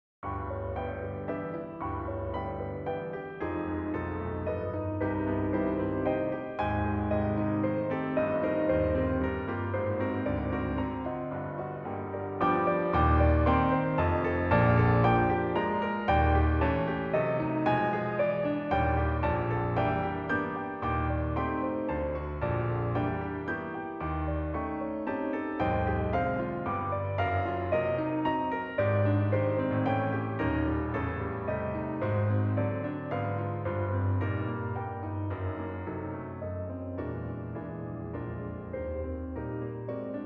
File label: Piano waltz; Delius